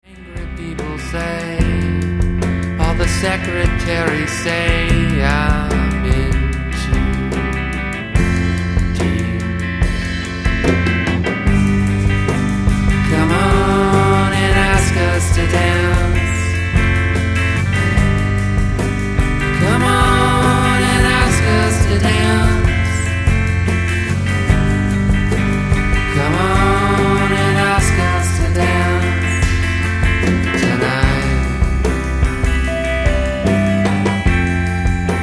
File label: rock drum